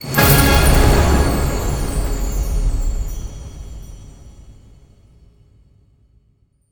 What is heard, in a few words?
mega Win End; netent